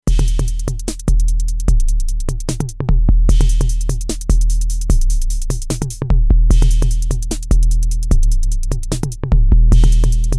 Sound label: Dubstep Drive